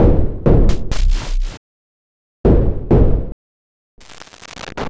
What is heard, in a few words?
Crunchy, Beats; Sonic Foundry (Sony Creative Software)